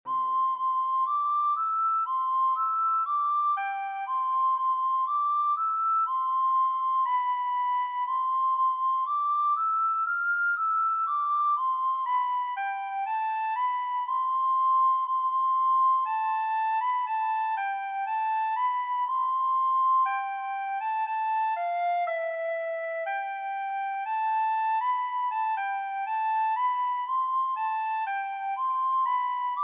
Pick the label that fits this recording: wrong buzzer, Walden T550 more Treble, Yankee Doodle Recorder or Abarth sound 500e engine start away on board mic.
Yankee Doodle Recorder